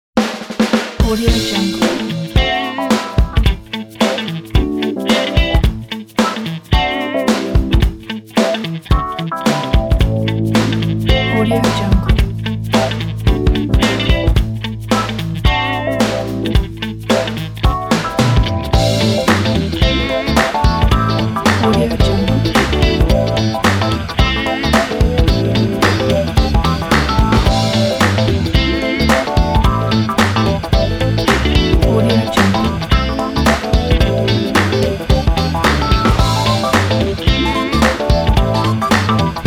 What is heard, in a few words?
funk meter groove